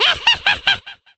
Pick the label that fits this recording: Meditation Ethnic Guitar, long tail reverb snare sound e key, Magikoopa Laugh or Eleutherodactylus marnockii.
Magikoopa Laugh